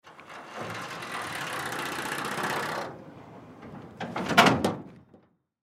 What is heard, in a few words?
Garage Door Close; Blastwave